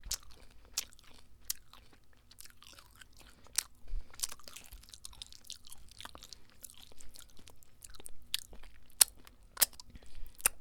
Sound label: Chewing Sounds